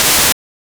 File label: spray, attack sound; カタナ１